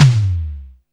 HEX FLOOR, TOMS; Back In Time Records; Big Bang

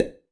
Lip Pop, Alesis D4

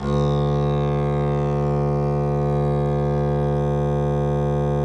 DBL BASS, Synclavier Strings; ILIO

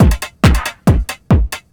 drm 138, techno drum loops

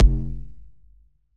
kick verb

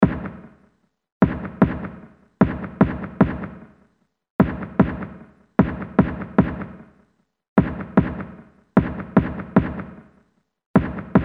Firing Range, Snare; Industrial

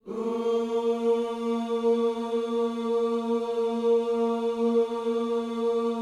OOS A#3, Gospel

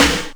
TURBO SNARE

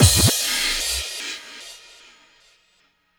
Electronic Fx Hit; Sonic Foundry (Sony Creative Software); Electronic Point Blank

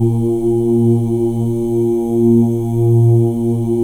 M+F OO A#2, Symphony of Voices; Spectrasonics; M+F OOS

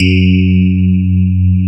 SPARKLES; Wizoo - Powered DX

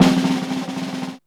ORCH ROLL, SNARES; Back In Time Records; Big Bang

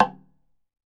TUNG LO, Tongue Drums; E-MU Producer Series Vol. 7 – Old World Instruments

